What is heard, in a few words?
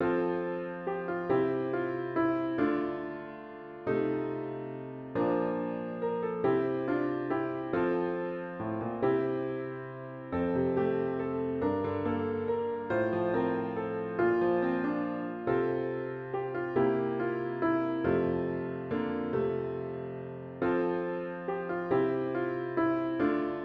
piano only